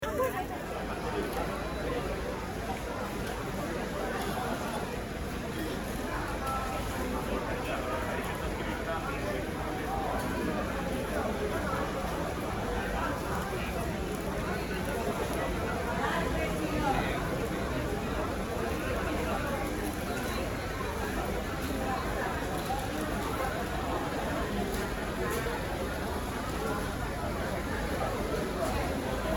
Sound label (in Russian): rynok